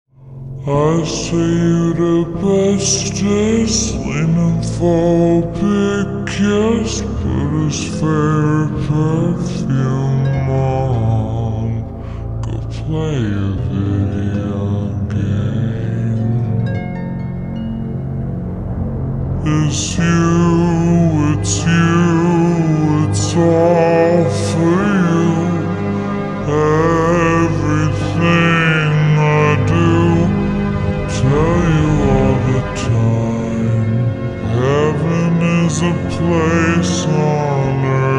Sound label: pitch down